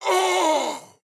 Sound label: AHurt; Black Adam